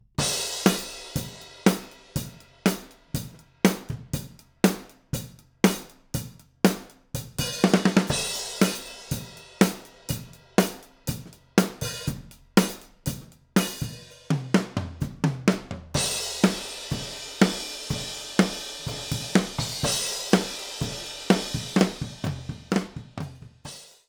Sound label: Drums pad; Bomblet